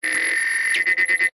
glitch digital

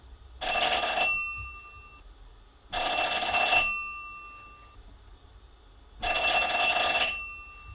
Bell Stereo House; london music mixing

